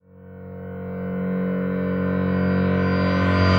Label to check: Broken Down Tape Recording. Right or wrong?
Wrong